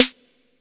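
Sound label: AMB snare drum; WORS STATION; AMBI BLEEP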